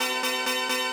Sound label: brass; Pony Fan Music Remix Stems